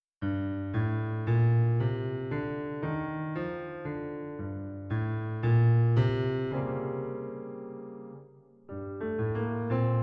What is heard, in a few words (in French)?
accompagnement